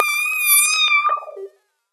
fx a bit sad; One Shot FX; Sample Magic; Transmission-X

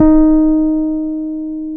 PNO, H.Pianet; Roland LCDP10 Keys of the 60s and 70s 2

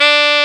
SAX XSAX, Sax Sect.; Saxophones

